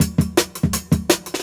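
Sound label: Jungle Breaks, 168 bpm; Yes I; Original Jungle Breaks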